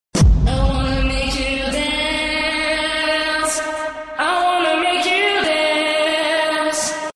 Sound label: Dance Sms